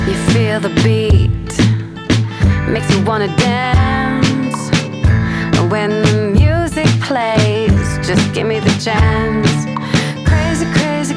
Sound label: Jingles; singing; voiceover